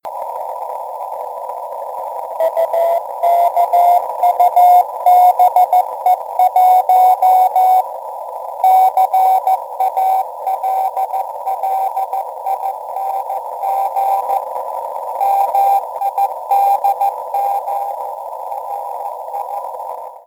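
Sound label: UKUBE, satellite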